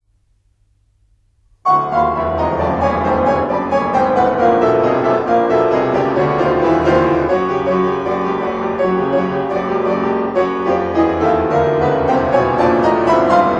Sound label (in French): PRELUDE 8 EN FA DIESE MINEUR